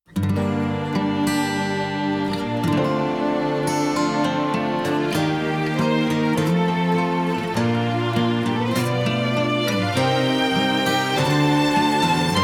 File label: instrumental ver.; Blessing of Campella; Shukufuku no Campanella theme song